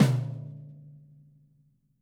SD TOMS, Living Drums; Sampleheads; Peter Erskine

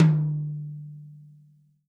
tom, Real Drum Kit, funk kit

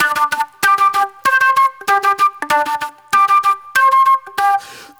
NY 70's Jazz Flute

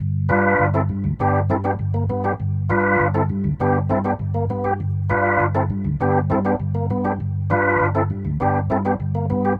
Rotor Riff, Organ; Loops; Rotor Riffs; Sonic Foundry (Sony Creative Software); Joe Vitale Organ Donor

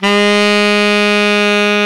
TENOR SAX; Alpine Volksmusik

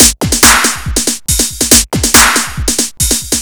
The Pounding jus snare, drums

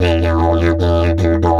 E 150, DIDGE RIFFS

